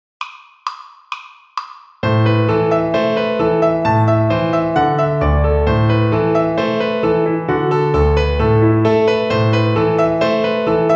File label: String Gym, Piano Accompaniments